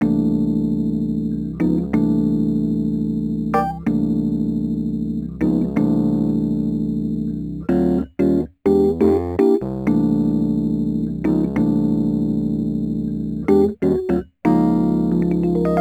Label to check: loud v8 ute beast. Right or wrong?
Wrong